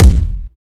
wet bass drum single hit a sharp key, kickdrum tuned to the A# note